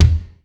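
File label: kick; Hollywood Edge; The Big Bad Beatmachine; PROCUSSION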